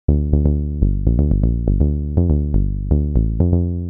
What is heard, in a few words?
122 BPM Bass Synth A maj G min Tonic D; Pro Bass Synth Studio Kit; MMB